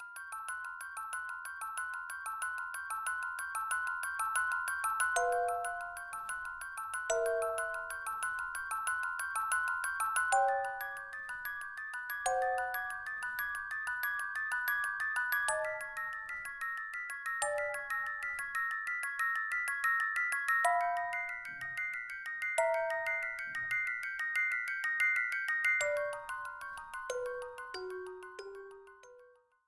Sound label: Ghost House Night; SMM2; SMW